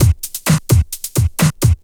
drt 130 electro, DRUMLOOPS, LOOP ELECTRO

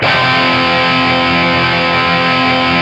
F#3 SOLDANO ROCK, Guitars; Spectrasonics; Hans Zimmer Guitars VOL-1

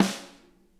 SNARE, Interactive Drum Kits; Sonic Reality

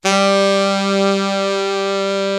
big sax, M1

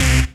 RAVE, RAVESYN

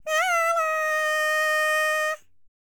HIMALAYAN, World Voices; ILIO; Vocal Planet VOL-5

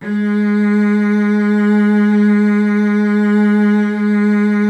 Virtuoso Strings; ILIO; Kirk Hunter Virtuoso Strings VOL-2; 90 s Sample CDs

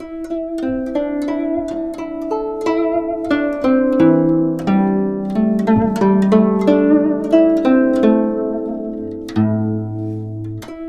GUCHIN 90 C; INIS - Opium

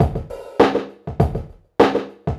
drloop, drumloops, Vinyl Attack; Masterbits

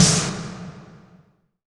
IMP SNR DRUM, Drum, Cinematic Impact

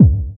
DR. LOOP, DRUMS; X-Static Goldmine 3

